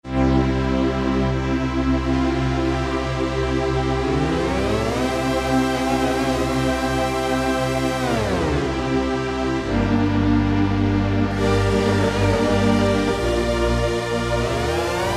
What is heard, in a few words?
After Bend-Panning; Roland PN-D50-04